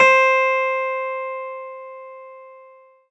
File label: CLAVI, Disco House; Best Service Dance Mega Disco House; CLAVINET